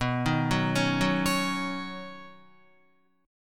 B Major Add 9th, Guitar chord; arpeggiated; 4F,4A,5C,5G,5C,6E; 6,6,6,6,6,9; JGuitar

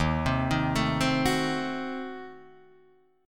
D sharp Diminished 7th D sharp, Guitar, Drop D; chordshape

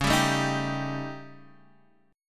Eb 7th Flat 5th Db

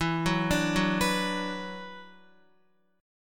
E 7th Suspended 2nd, down, Guitar; E7sus2; arpeggiated

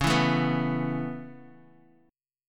Db Minor 7th Sharp 5th, Bass Standard; strummed; JGuitar

